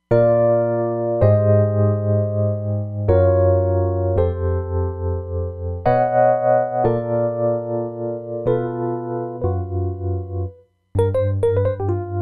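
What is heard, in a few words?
Tremolo EP, Roland Fantom XR; Brill TremEP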